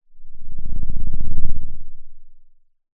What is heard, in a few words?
pop